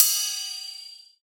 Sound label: Ride; Raptor; Cymatics; Dubstep Starter Pack; Drums - One Shots; Cymbals